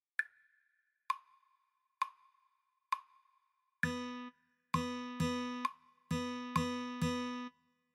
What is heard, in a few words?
8ths with rests, guitar